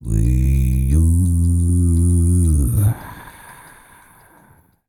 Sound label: CROON, World Voices, BRAZILIAN; Spectrasonics Vocal Planet CD5